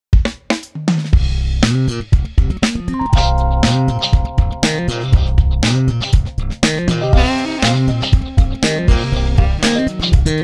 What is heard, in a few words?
Jazz Funk; Funky